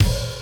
Hat Combo, Kit; One Shots; Touch Loops; ClassicBreakSessions

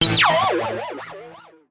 KEY SHOT TEKNO 140 A; Techno2; Singleshots

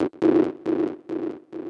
KEY SHOT TEKNO 140 A; Techno2; Singleshots

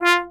trombone staccato F3; Orchestral; DirectWave; Varazdin Orchestral

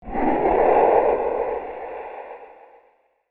SPACE FX 140; SpaceSounds

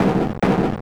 TECHNO FX 140

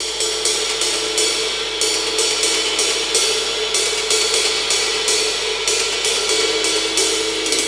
125 Classic Breaks, 125

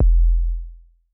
A#1 Pure Tuned Kick, Tuned Sub Kicks; One Shots; Touch Loops; ElementsOne; Abstract Rhythms

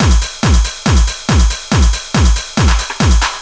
hard beats 140, hard trance beats

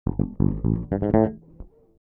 DEEPHOUSE BASS 125 A

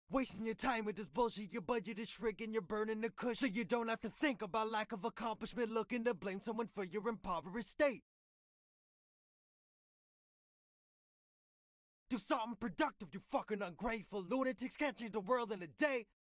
Verse Dubs

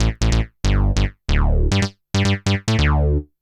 SNTH BASS ELCTR 140 A, Techno

